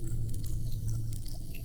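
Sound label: Music Dpad Evaporate 145; From Dust; ps3; xbox 360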